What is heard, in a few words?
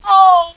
colette pain; svencoop; decay